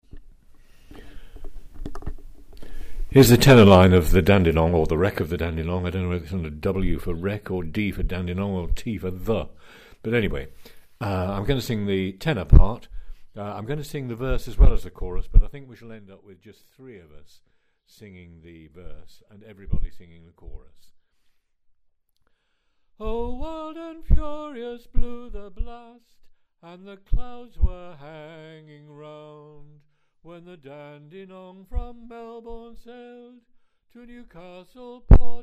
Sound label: Tenor; Dandenong